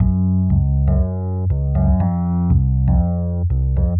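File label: Rhodes Bass Gb, Nu Jazz House, Bass Loops, 120 bpm; PowerFX - Nu Jazz House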